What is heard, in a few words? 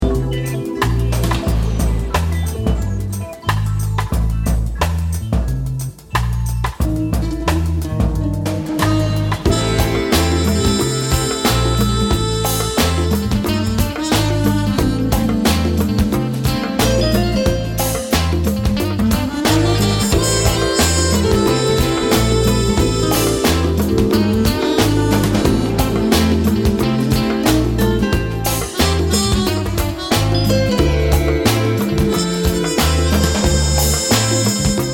Polka